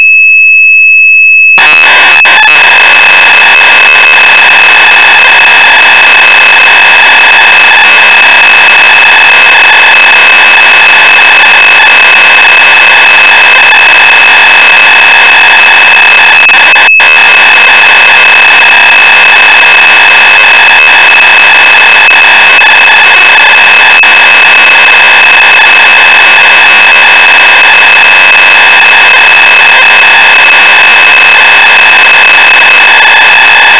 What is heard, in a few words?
Horror